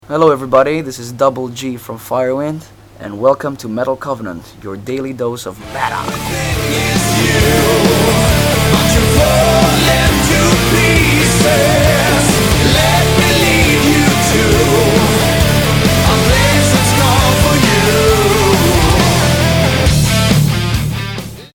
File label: jingle